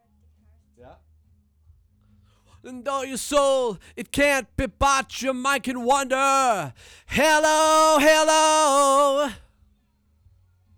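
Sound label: vocal; Pro Tools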